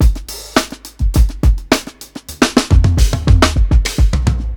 105 BEAT W FILL, DRUMS LOOPS; BASEMENT DRUMS VOL 2

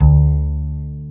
BASS, 140 BPM, Drum & Bass; Ueberschall; Drum & Bass Flash Trackz

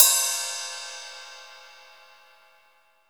RIDE, MONO RIDE CYMBAL; AKAI S6000 CD-ROM - Volume 3

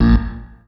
Pulsar; Zero-G; Total Drum Bass; Hits and Bits

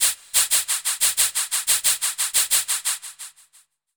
Calmer Shaker; Zero-G Smoke

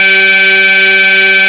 G3, SYNTHESIZER; AKAI S6000 CD-ROM - Volume 1; GLUNGE

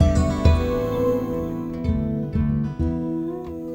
Gtr Kantele Down; Sonic Foundry (Sony Creative Software) - Friendly Fire; DroneLoop

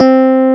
PUNCHREEDS; Wizoo - Powered DX

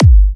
G, Kicks; markus hakala

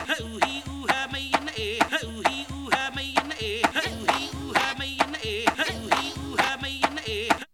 HOO HE HO, ETHNIC; AMG; Global Trance Mission VOL-2

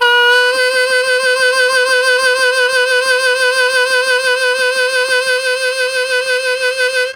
Strong Vib w Scoop C2, Harmonica; Zero G

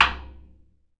Surdo rimshot; E-MU Producer Series Vol. 2; More Studio Essentials; Composer

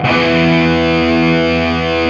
BAR D#, HEAVY METAL; Greytsounds

